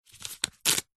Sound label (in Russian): validator biletov